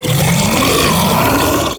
Grendel Feast Enemy Pull; Warframe

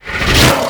Dragon Luck Deactivate

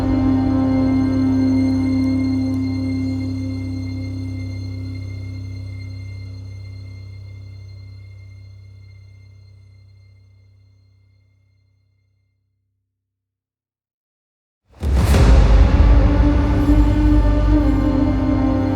Back Room Reveal Stinger; Entrati; TennoCon 2023

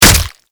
Berserker Barrage Hit Flesh B; Warframe; Warframes

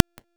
Equip Impact; Dante; Noctua; Warframe

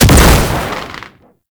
Hellfire Projectile Explosion; Enemies; Abilities; Warframe; Tau; Roathe

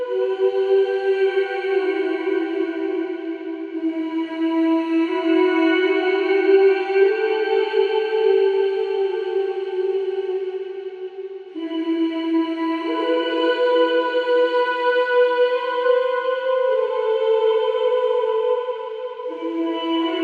Egg Elevator Cue; Tau; Tau Quest Cues

